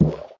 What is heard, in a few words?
Collision